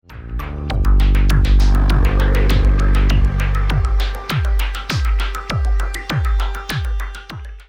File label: rsf kobol